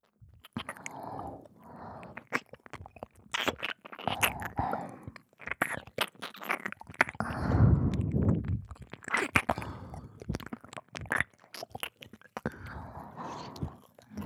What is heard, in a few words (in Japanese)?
Wディープキス